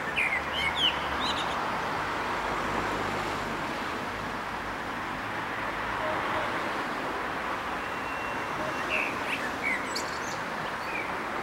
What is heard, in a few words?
urban blackbird is louder than traffic